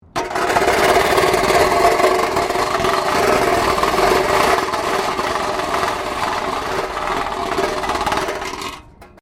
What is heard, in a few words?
METAL BINLID SCRAPE WIRE FENCE; Sound Effects:Materials